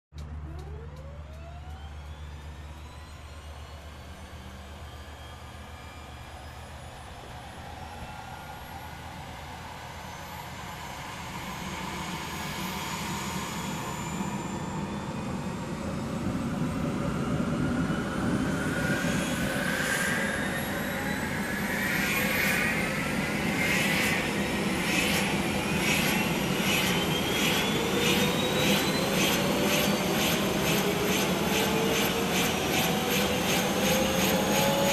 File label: Helicopter engine start from passenger view